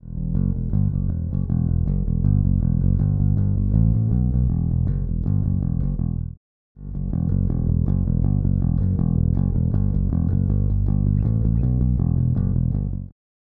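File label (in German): Sadowsky plus Aguilar Plektrum, bass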